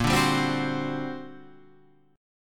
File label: G Minor Major 11th, Bb